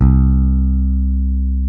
P BASS C3, pick bass

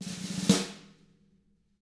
SNARE ROLL

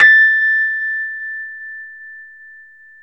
Rhodes 73 Slow, A; E-MU Producer Series Vol. 5 – 3-D Audio Collection; 3DRhodes

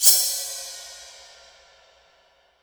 IMPACT SCARY, Cinematic Impact; M-Audio Pro Sessions; Liquid Cinema

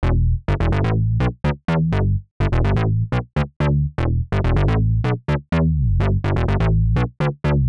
Synth Bass E, 125; PowerFX - Nu Jazz House

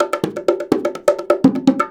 Percussion Loops, Bongo & Conga; Houseworx

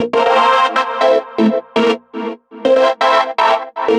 Progressive Synth, Trance

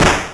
snr; Stereo

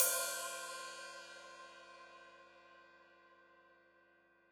Hat Open, Hi-Hat; Deeply Sampled Drums

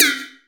Perc

